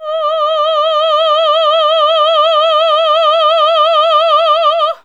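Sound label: classical female D#4, choir; Best Service Gigapack 1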